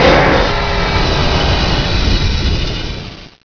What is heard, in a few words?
train brake